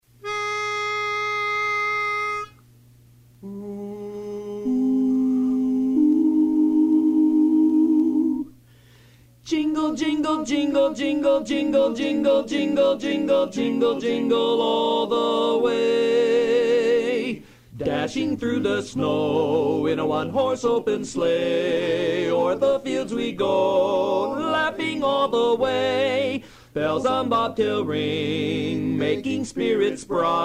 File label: Lead